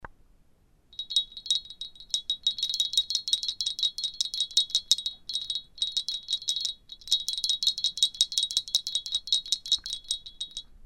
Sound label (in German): schelle ton